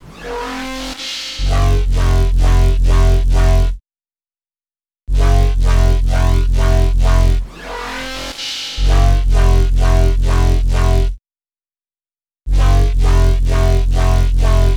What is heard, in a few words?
Riddims 130bpm, Bass; Crowdsourced Sample Pack